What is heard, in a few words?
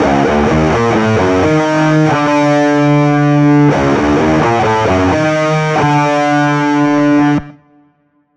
Rock Guitar; Recording One Rock Guitar; Zystrix; reverb S Intimate patch from the free epicVerb by Variety of Sound; the other guitar gets the Brass Blatt patch from the Supermassive by Valhalla